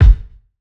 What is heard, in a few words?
2000s short reverb kick single shot g key; modern kick drum